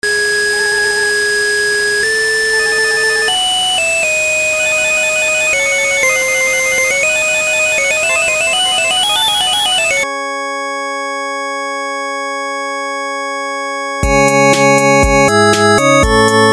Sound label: 8 bit